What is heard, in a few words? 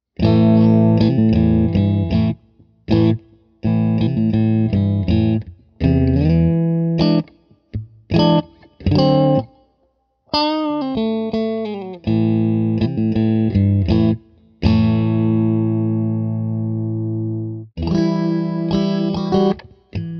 clean